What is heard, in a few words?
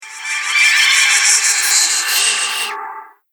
Magic Necklace Of The Night, magic sound effect; Fantasy; Andrew Fleming